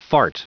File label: fart